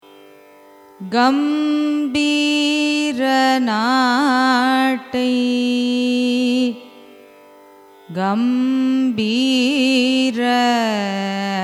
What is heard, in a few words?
raga gambheera nattai signature; carnatic music